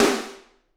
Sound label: SD, Drums; Bob Clearmountain Drums I